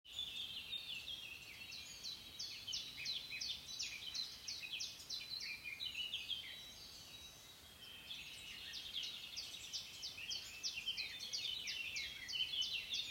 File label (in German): Vogelgezwitscher, Koenigsberg